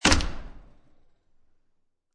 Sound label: Door Close Wooden And Glass, Household, Door Close, Heavy Wooden And Glass Door; Miscellaneous Door